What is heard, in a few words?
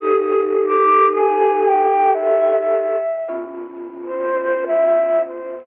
flute and vibes of the damned, Loops, Sad